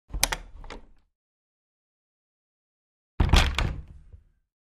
Door Wood Open, Close x2

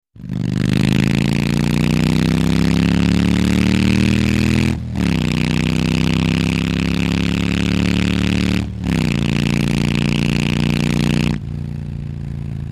Harley Motorcycle On, harley davidson; harley-davidson ob

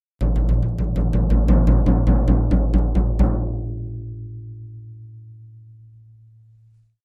Drums Toms C Low Slw Do

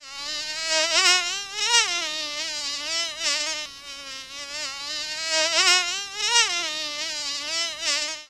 Fly Isolated Buzzing

